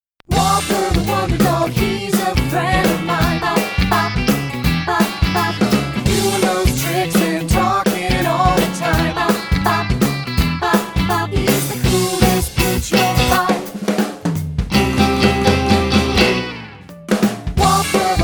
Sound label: Jingle